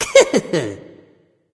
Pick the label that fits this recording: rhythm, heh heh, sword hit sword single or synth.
heh heh